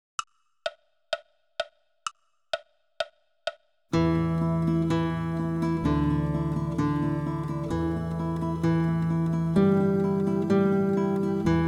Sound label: mini tremolo; Nicolas de Rigal